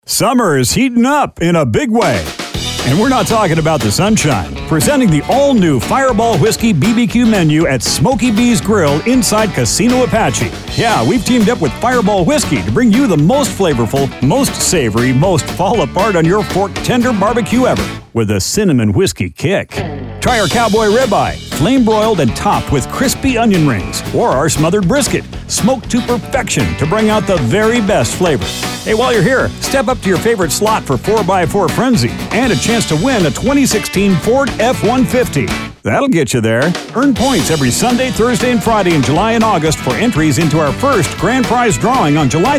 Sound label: Jingle; Casino Apache